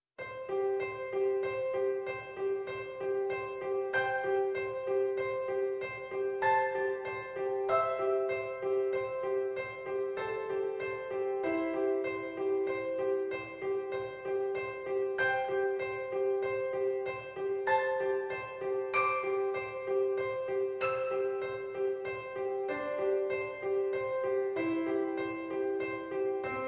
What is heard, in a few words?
Soprano; Practice Room